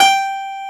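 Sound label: PIANO ROOM G4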